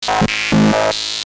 doepfer morph filter

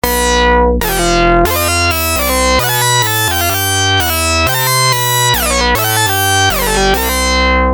mar sync glide; consequence mars

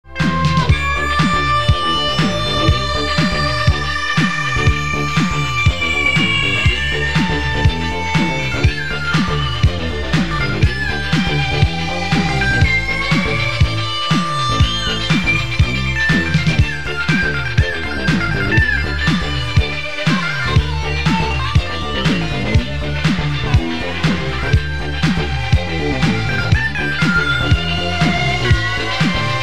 MC4 jupiter8; roland

